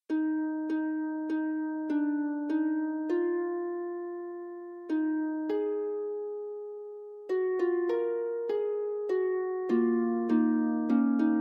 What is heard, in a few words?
Fugue, harp; Forty Eight Preludes and Fugues Book 2